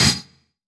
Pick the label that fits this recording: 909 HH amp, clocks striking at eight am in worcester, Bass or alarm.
909 HH amp